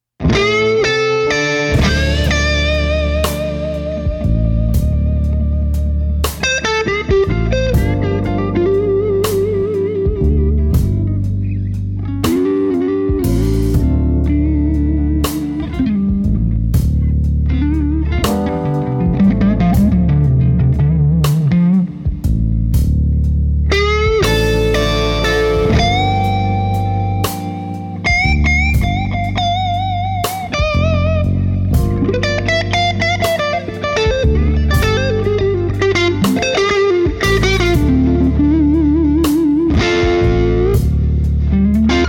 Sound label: clean slow blues